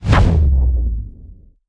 beam activate; smite; god atlas

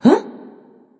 surprise; smite; voicepack; medusa

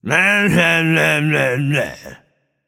tongue; Smite; bacchus

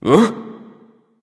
confused; smite; hou yi

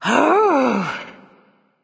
sigh; smite; voicepack; kukulkan

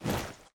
armor; smite; horus

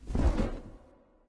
wingflap; smite; thanatos

